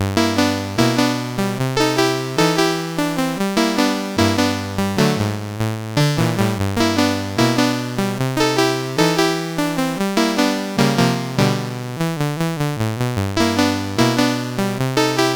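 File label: Maze, MOS 8580 SID chip music, games; CSG8580R5; hvsc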